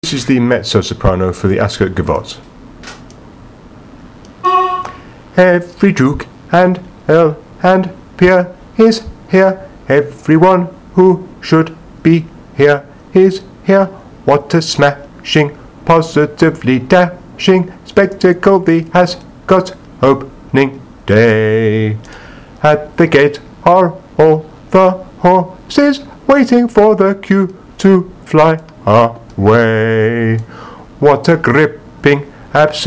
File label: mezzo; ascot; My Fair Lady